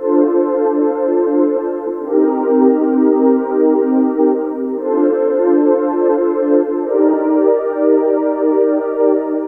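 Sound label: PAD1 Right, FUNK; USB Soundscan vol.47; AKAI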